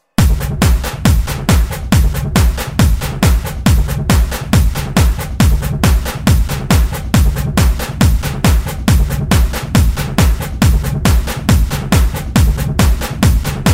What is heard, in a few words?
Techno Mix; Pracatum Salvador